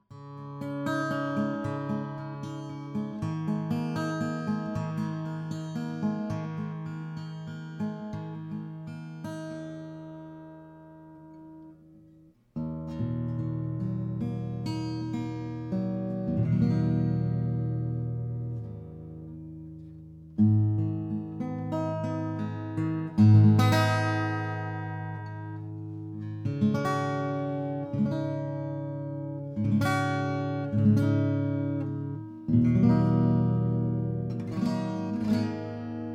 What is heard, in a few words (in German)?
superlux r102 baendchenmikrofon trocken